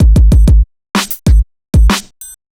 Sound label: four quick kicks, drum loops; hh drum loops